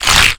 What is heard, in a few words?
Pullout Splat; Nicktoons Nitro; arcade